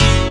Bizarre Hit Gbm7; Digital Sound Factory Xtreme Lead

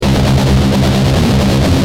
G Jam, Guitar; Industrial

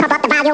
pop; Amiga Soundtracker Sample Packs

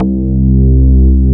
BASS, DANCE

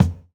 Kick, jungle breaks drum sounds

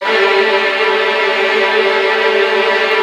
VN TRILLS, Virtuoso Strings

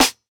Snare, jungle breaks drum sounds

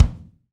BD, BD REAL

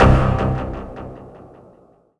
Dance Mega Synth; Best Service; 90 s Sample CDs

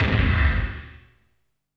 NASTY BDRUMS; Zero G; Malice In Wonderland

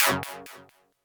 Stabs, Elektron MachineDrum SPS1 MKII

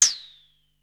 Open hat; ZERO G - Datafile DISC 3

